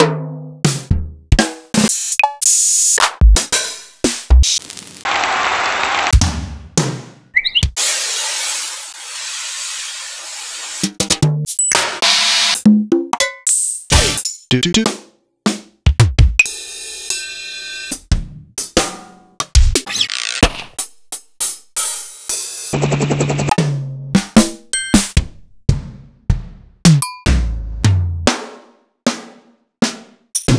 Ensoniq ZR-760

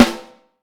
HHB, Vintage Snare Drum; Q Up Arts; Johnny.C's Vintage Snare Drum; 5.5X14 SLR

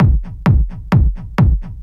straight, Techno Trance Essentials drum loops

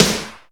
Reverb Kick Snare; PS-37 Dance Synths; Percussion

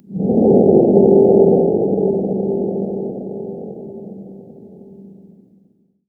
C2, Synth Line

